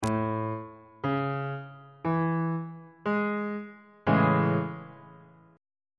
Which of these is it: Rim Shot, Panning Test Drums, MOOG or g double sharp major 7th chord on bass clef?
g double sharp major 7th chord on bass clef